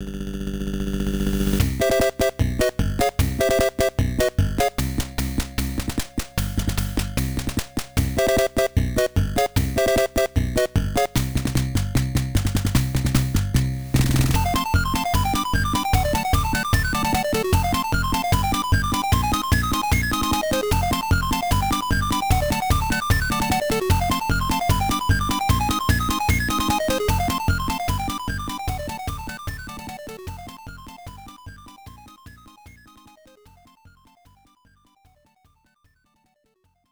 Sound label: Battle Victory; bomberman 94; turbografx 16; pc engine